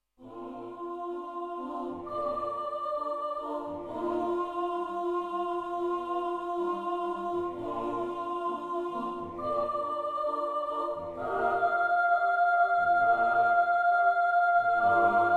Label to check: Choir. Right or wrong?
Right